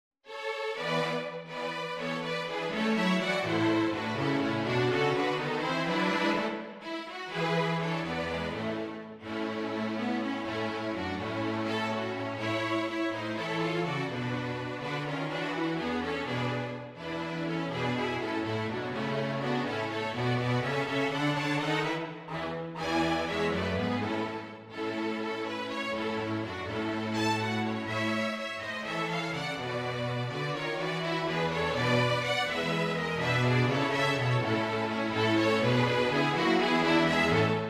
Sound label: Away in a Manger string